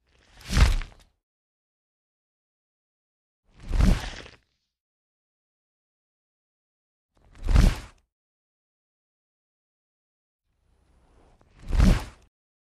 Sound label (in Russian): Птицы